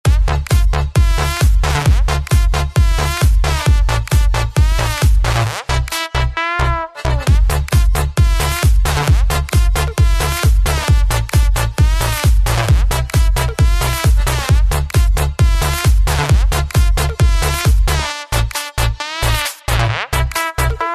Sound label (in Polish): klubowe zm